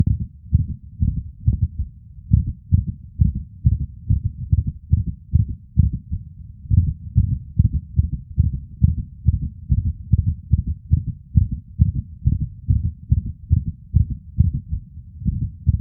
SINGLE VENTRICULAR ES, Heartsound; Heartsound Library

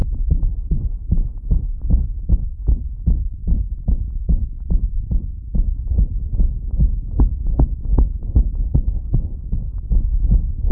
ATRIAL TACHYCARDIA, Heartsound; Heartsound Library

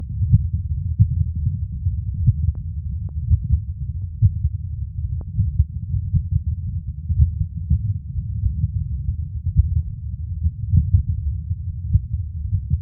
BIFOCAL VES, Arrythmia, Heartsound; AES; Heartsound Library

